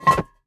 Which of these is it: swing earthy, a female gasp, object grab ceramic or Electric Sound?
object grab ceramic